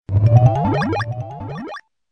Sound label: Scuba, Nokia Alerts; Windows Phone apps